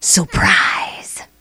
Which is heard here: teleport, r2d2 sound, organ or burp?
teleport